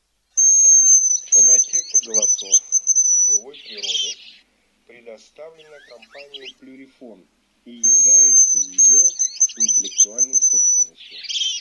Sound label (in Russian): Рябчик Francolino